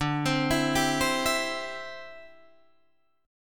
D 7th Suspended 2nd Suspended 4th D, Guitar Drop D